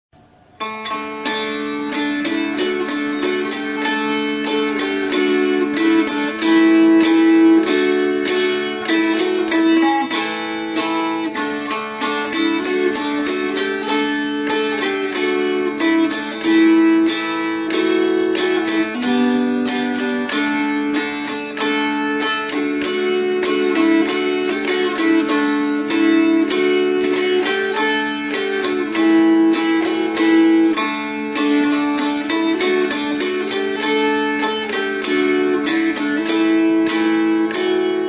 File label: dulcimer; Simple Gifts